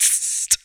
VOC DRUM, MOUTH PERC; Best Service Hyper Dance

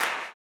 CLAP, Blues Soul; Spectrasonics Vocal Planet

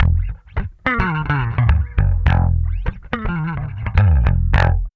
BASS, Funky Ass Loops; East West

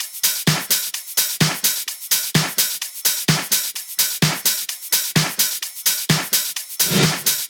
House loops

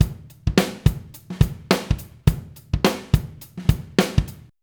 BEAT, Beats 106-111bpm, Hip Hop; E-MU Formula 4000 Series Vol. 1; Hip Hop Nation

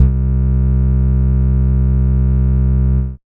Moog Bass, Dance Synths

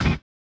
collision; Spectrasonics; Metamorphosis

